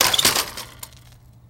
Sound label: Bike Old Rusty Droppi